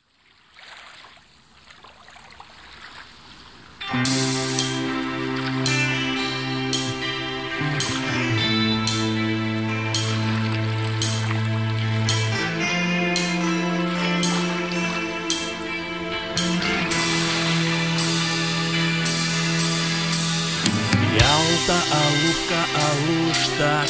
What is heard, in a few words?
guitar, ukrainskij rok